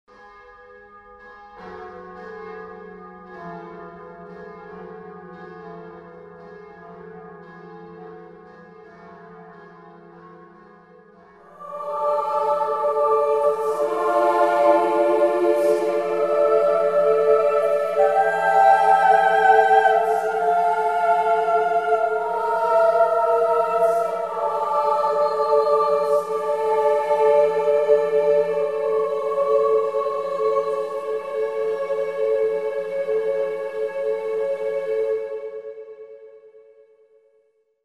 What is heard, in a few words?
bells and choir; wersi